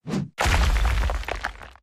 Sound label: attack; Skillzgaming; battle Mania Desktop